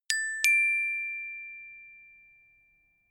notification glockenspiel fourth up

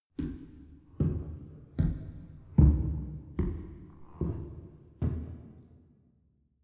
step church wooden stairs medium-fast

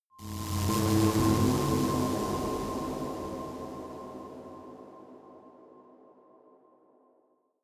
space unknown alienation; energie

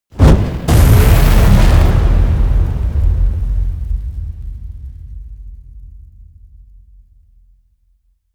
spell fire cast large; explosionen; comedy-cartoon; soundpool

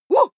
female shout short woo; schreie; menschen

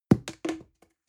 plastic can small fall bounce hard; objekte; dose

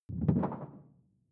sci-fi alien bubble; swooshes-swishes

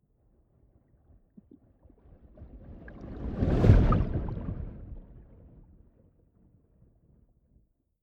whoosh abstract slow

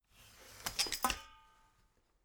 folding chair unfold slow; objekte; stuhl

